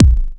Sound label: Kick One Shot, Drums